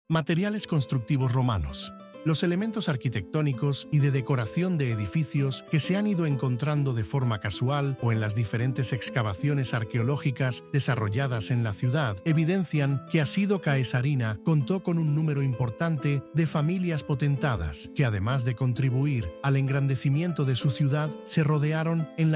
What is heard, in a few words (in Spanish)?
audioguia música